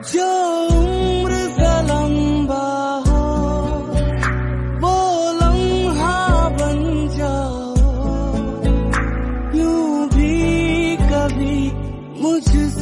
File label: Krishna Bhajan Ringtone